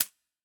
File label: Perc Brazil Shaker Short